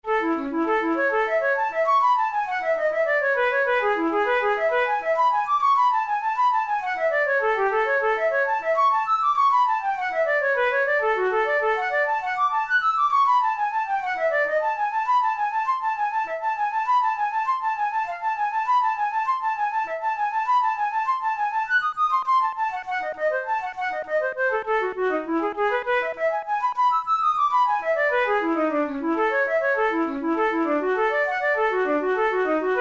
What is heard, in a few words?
etudes, flute